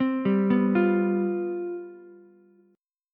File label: C Major 7th Suspended 4th, down, Ukulele; arpeggiated